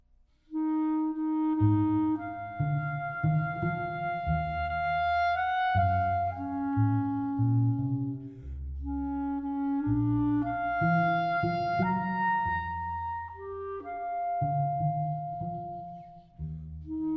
Clarinet and Double Bass - 3. Very slow and hesitant